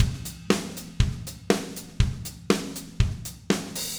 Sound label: 120 Easy Rock Drums Verse; Pop Rock; Fast Tempo; Digidesign; Pro Tools; Pro Tools Essential Loops